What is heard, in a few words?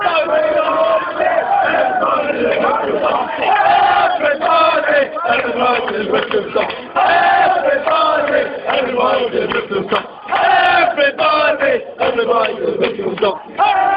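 broomfield stomp, football songs and soccer chants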